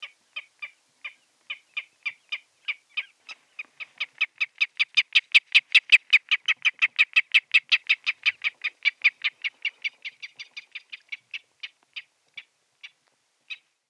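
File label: goldfinch voice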